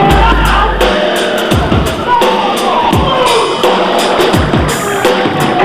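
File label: drum, Breakbeat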